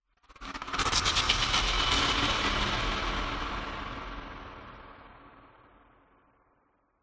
sound design cinematic sparking droning stutter sweep